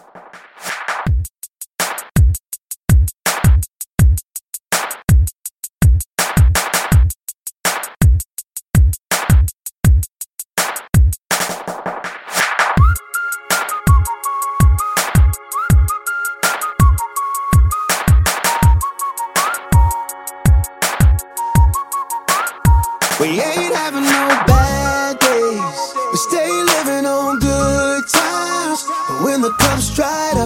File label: Mixshow, 82